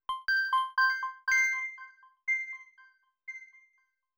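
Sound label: granular bell accent C major; bespoke - Floral v.2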